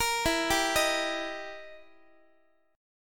E Minor Major 7th Flat 5th, E, down, Guitar; arpeggiated; JGuitar

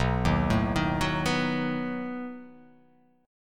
B 7th B down

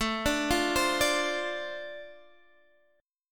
D-Minor 7th A, chord

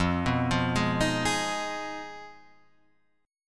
F Minor 7th Flat 5th F

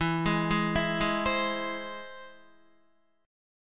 E Augmented, Guitar; arpeggiated

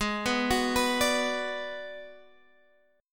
Ab Minor 7th Flat 5th Ab, down, Guitar, Standard; arpeggiated; JGuitar

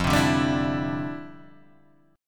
D Minor 11th F

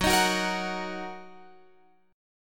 Gb 6th Gb down Guitar Open F; Gb6 strummed; JGuitar

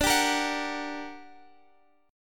G Minor Major 7th, D, guitar chord

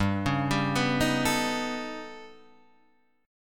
A 9th Flat 5th G, chord

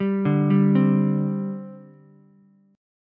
C Suspended 2nd C Ukulele; arpeggiated; JGuitar

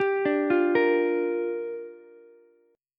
G Minor 7th, Ukulele; Gm7 arpeggiated; JGuitar

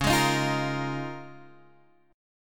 Db 6th Add 9th Db down Guitar Open G

